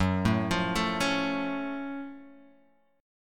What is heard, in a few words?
F sharp Minor Major 7th F sharp; arpeggiated